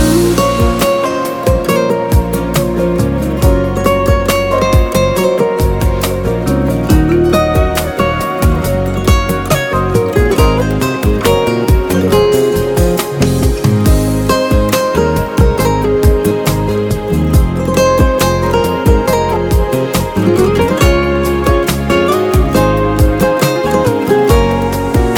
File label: Ringtone Mobile